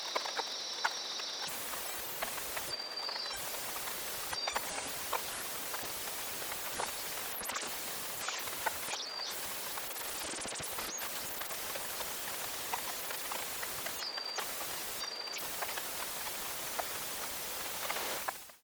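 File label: talkie box noise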